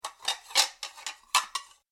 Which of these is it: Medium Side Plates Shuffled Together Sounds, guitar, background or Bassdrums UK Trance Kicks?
Medium Side Plates Shuffled Together Sounds